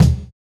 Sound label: Kick, jungle breaks drum sounds